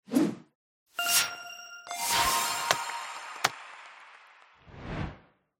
clap

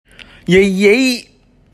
yay yay big

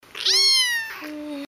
meow

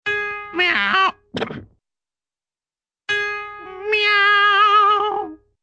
cat tunes up its meow

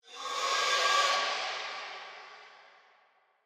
alien screeching

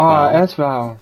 aww

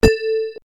bumper; sonic the hedgehog

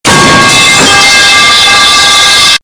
metal pipe boom; vine boom

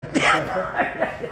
wheeze

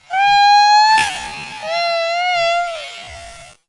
heeeheee slowed

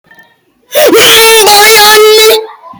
loud song

